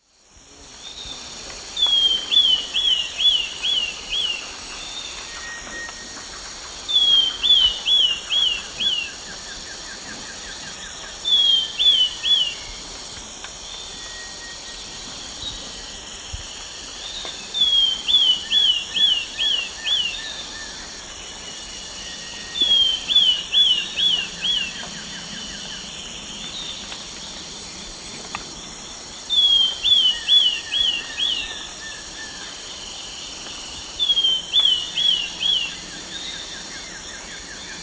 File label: Pachyramphus castaneus, song; S. America; Eastern Lowlands